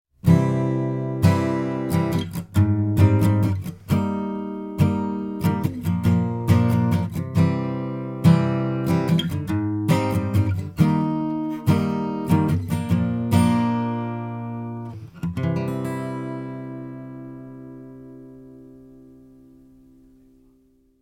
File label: Acoustic Guitar Strumming; se electronics gemini ii